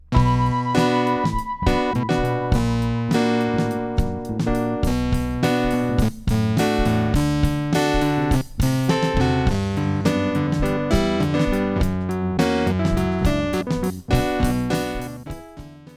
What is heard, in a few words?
elecpiano drums